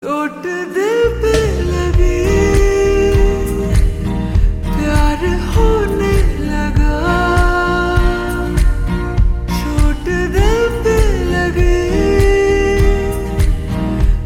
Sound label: Ringtone Slowed Reverb